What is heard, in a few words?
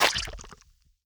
seedmon damgup; monster